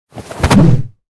throw; battle

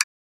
menu click

